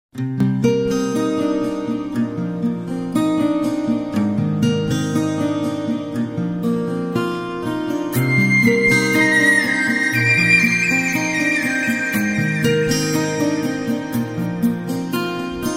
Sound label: slow